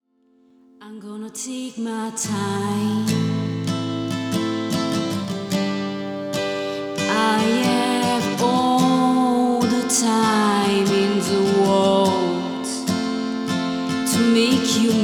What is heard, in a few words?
Acoustic Live Version; Wembley